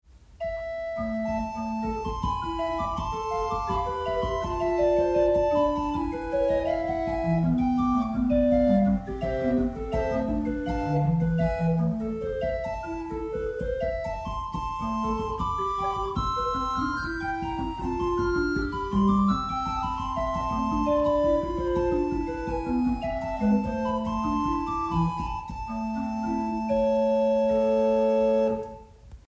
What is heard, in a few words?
Mayer, pipe organ